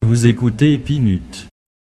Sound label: jingle